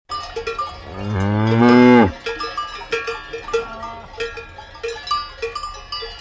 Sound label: cowbell